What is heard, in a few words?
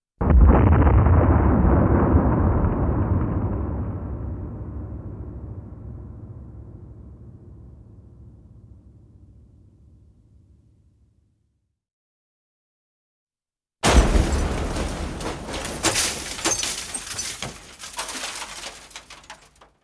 Explosion